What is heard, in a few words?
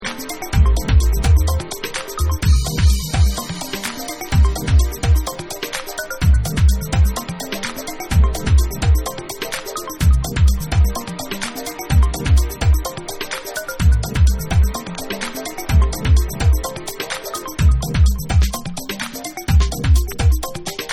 JAZZ